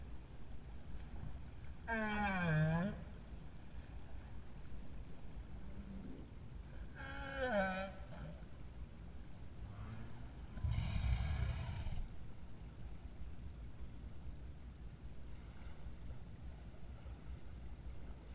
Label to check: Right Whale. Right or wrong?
Right